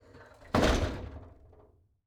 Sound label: metal door close; domestic sounds